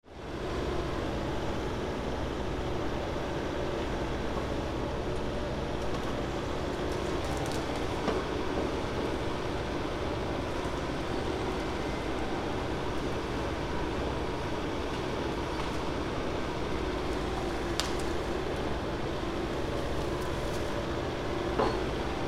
forest; fukudai

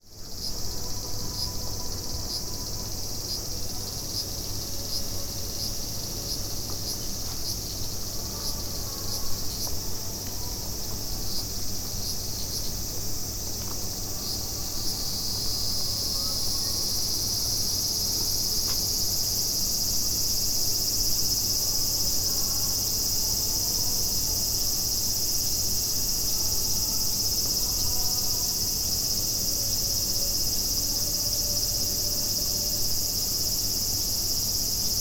cicada; fukudai; coop

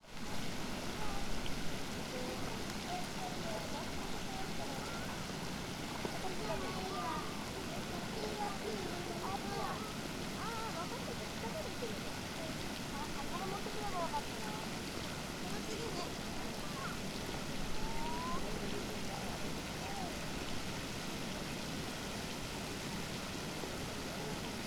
pond 4 seasons

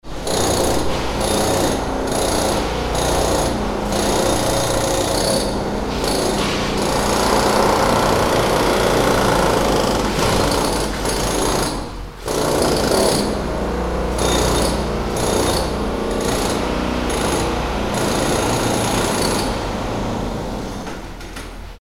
drill

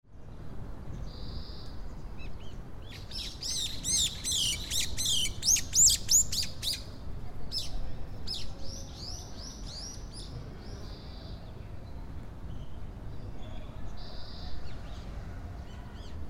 watari jr high

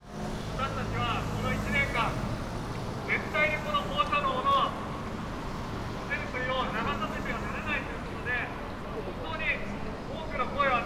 fukushima station road

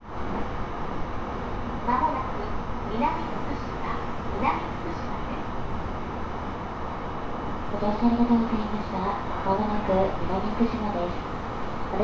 jr tohoku line

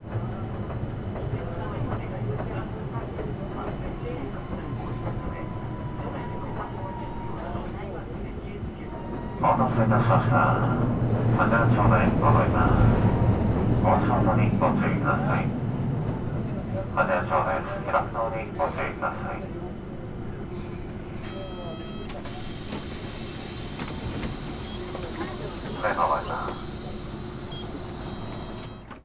kagoshima city tram

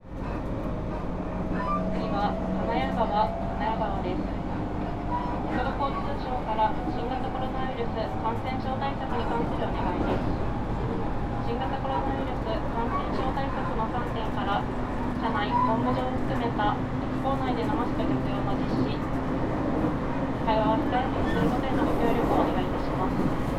jr tohoku line; minamifukushima-kanayagawa

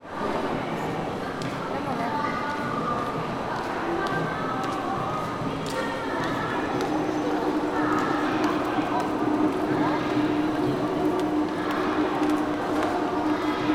pachinko suzume odori, sendai arcade

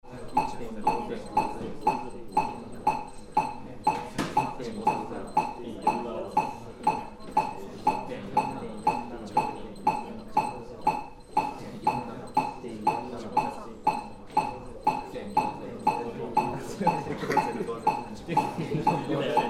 e metronome; 120 bpm (electric version); 共生システム理工学類の１年生は避けて通れない実験科目での一コマ．

